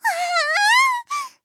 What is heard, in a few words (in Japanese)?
"あああああん！", クール; ハイグレボイス集